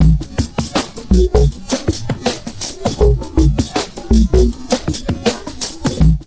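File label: drum loops crazy processed, 80 bpm; USB Soundscan vol.07; AKAI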